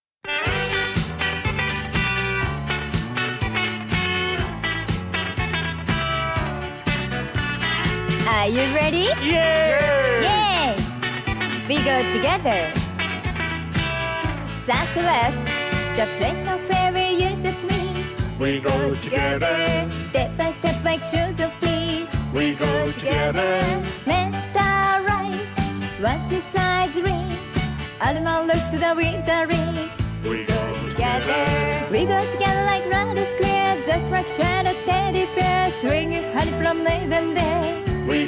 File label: vocal